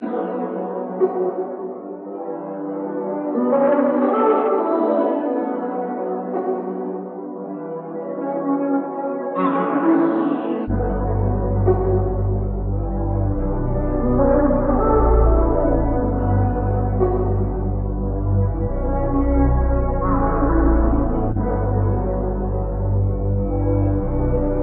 90 BPM B Min, Melody Loops; Cymatics; Orchid Sample Pack

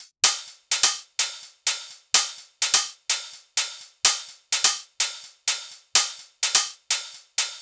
Tech House Kit 126bpm Hihats; EDM Drums; Drum Kits; Stems; Ghosthack; Ultimate EDM Drums; Tech House Kit 17

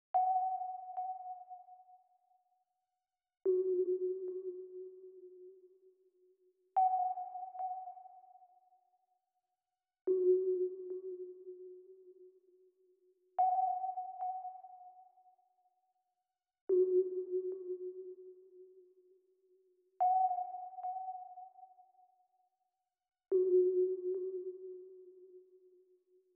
145 BPM F# Min Pluck Beep, Loop Stems; Melodies; Cymatics; 2022 Melody Mystery Expansion; Overdrive

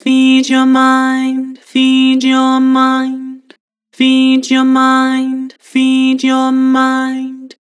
Vocals, Flat, Feed your mind; Harmor

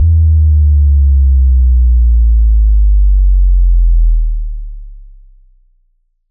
Sub Drop; FX; Cymatics; Light Free Sample Pack; Bonus Samples

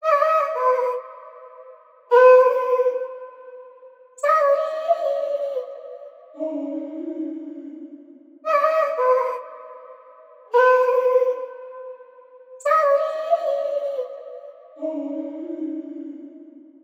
Vocal Chop, 114 BPM G# Min; Vocals; Heart; Cymatics; ZODIAC Beta Pack

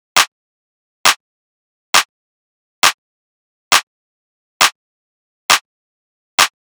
Clap Loop 135, Techno; Ghosthack; Techno Sounds 2025

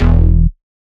Bass Shot E

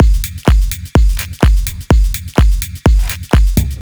Drum Loop - 126 BPM F, House; Full Drum Loops; Guest List; Cymatics

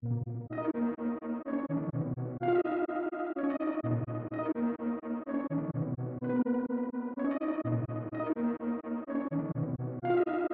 126 BPM D# Min Main Melody, melody loop stem; Trap; Loop Stems; Cymatics; 2022 Melody Collection; Trap Pt 1; Cold Feet

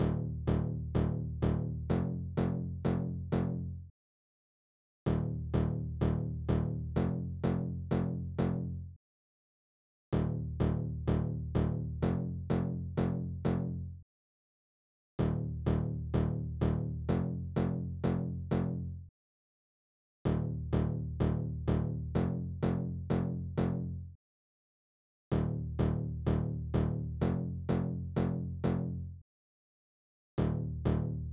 bass, 95 BPM D# Min; Dope

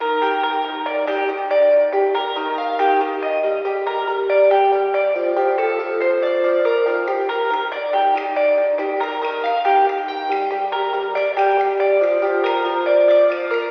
Melody Loop - 140 BPM G Min, Synth; Cymatics; Light Free Sample Pack; Bonus Samples

